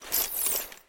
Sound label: deactivate, Goggles